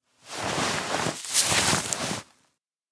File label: light, Vaulting; ledge grabbing; Ledge Climbing Mantling